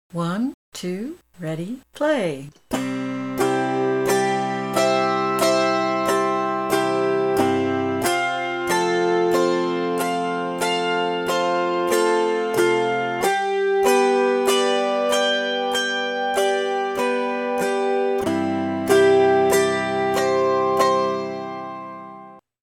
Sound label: Arpegio Exercises